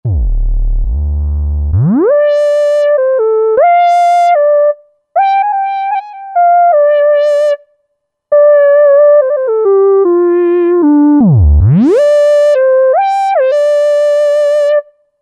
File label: Ld, Hip Hop; Sat.Nite Ld; Roland SR-JV80-12 Hip Hop Collection